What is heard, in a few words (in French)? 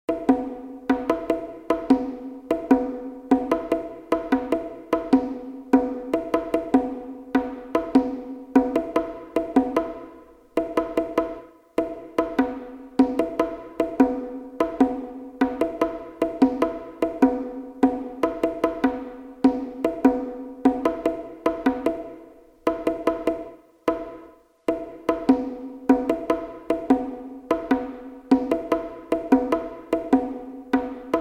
rythme